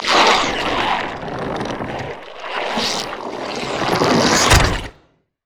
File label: elastic slingshot; LoL; zac base